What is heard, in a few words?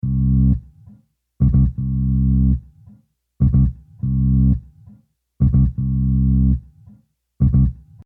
Bass